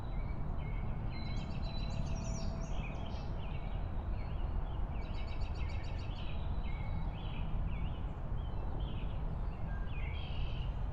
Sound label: park ambience